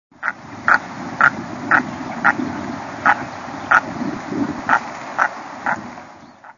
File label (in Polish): nurogęś - nawoływanie